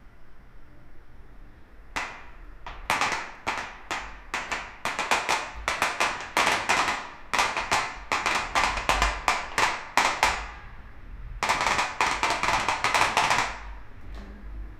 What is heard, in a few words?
collapsing building house